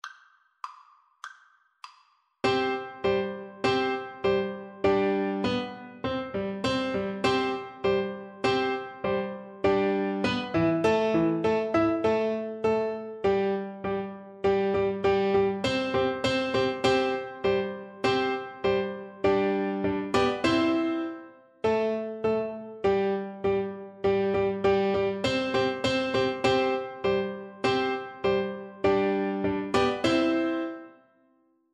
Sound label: Turkish March Ruins Of Athens Recorder; Beethoven; Op113